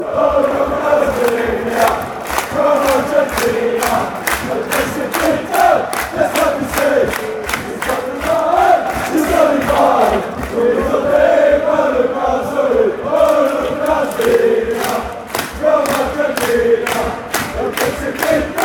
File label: anderlecht chant